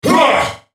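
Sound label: spellcast effort; Jayce Sentinel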